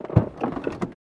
Cessna Pedal Up

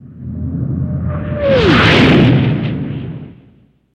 Starship Warp Spd Pa

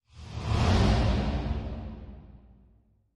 Animal Stinger Fast; stingers & whooshes

